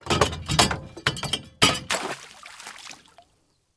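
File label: anchor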